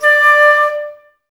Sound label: flute, Wood Winds, Flutes